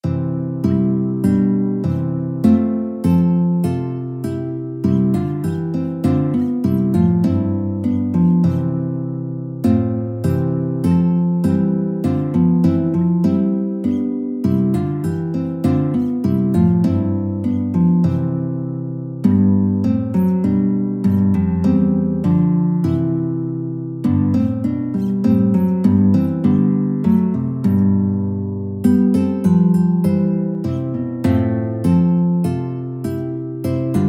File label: guitar